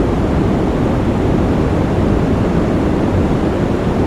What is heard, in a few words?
Amb Weather; Red Orchestra 41-45